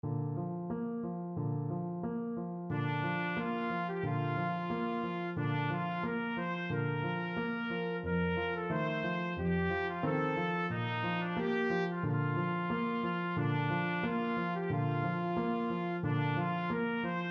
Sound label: aria trumpet